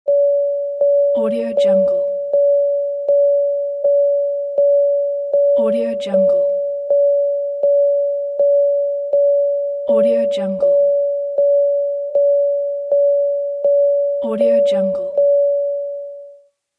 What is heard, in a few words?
car door warning beep